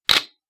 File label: d3 shutter